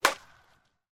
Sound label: sport baseball hit; martinimeniscus